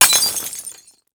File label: Window Break, Crashes